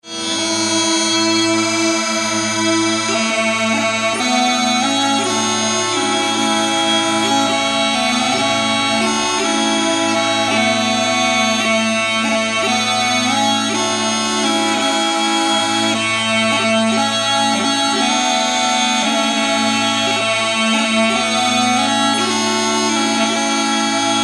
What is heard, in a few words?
Sine